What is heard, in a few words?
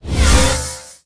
Pickups